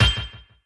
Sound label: Popup; Imagine Babyz; 3DS gamerip 2012